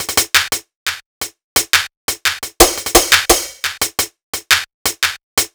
Hi-Hat Clap Combo, Hi-Hat Loops; KJ Sawka - Drum n Bass & Beyond; Drum Tool Box

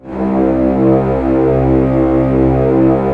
orchestra C1 FF; Sound On Sound - Peter Siedleczek's Orchestra

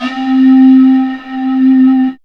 Dance Synths; Best Service ProSamples vol.37; Ethnic Synths

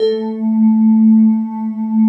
BELLS AND; Synclavier Sampler Library; KEYS AND GUITARS

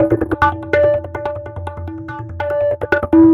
TABLAS; Zero-G; Chemical Beats

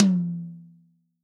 TOM 16, real drum kit; HIPHOP KIT

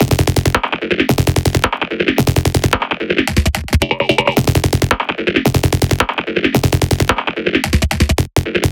110 Fm, Melodic Loops; M8; Electronisounds

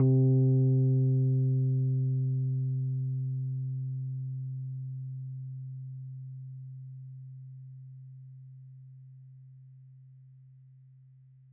C3, Rhodes Mark II; mkII 88 key LO; CEPCo Sample Series Vol. 3

